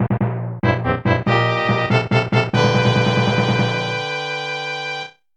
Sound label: Dungeon Win